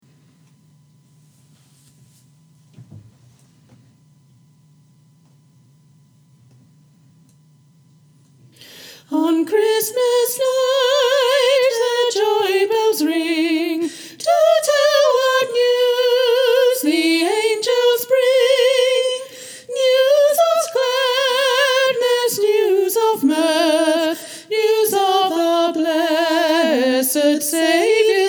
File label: V12 MELODY